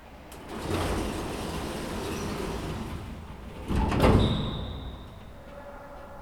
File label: elevator door closing natural hallway echo